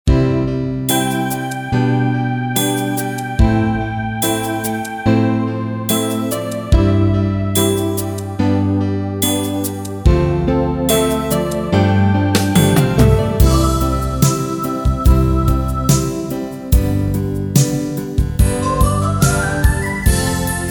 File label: Pop Ballad, Casio CTK-601 Rhythms